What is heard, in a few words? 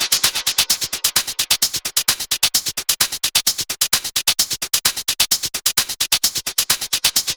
Perc Loop 130, techno; Techno Sounds 2024; Percussion